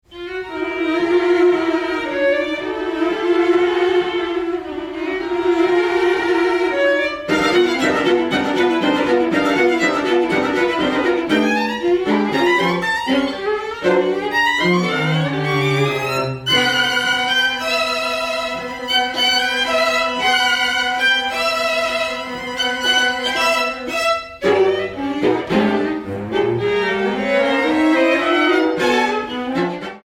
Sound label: String Quartet; Matangi; String Quartet No3